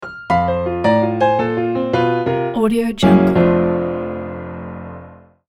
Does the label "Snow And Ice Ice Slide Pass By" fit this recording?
No